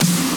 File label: Percussion